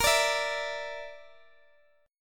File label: Bb Major Flat 5th Bb down Guitar DADGAD, chord